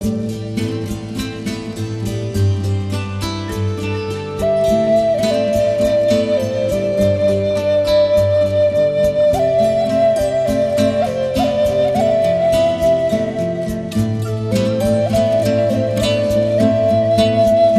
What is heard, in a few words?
Passion Flute